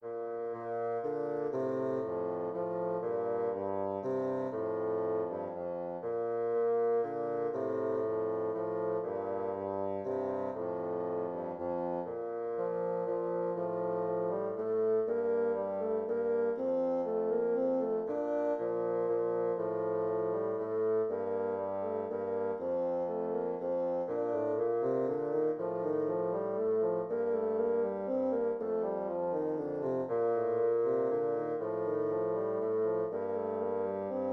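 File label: chaconne bassoon duet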